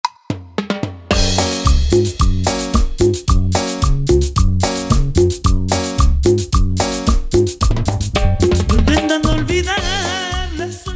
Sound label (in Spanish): Intro Acapella 110 BPM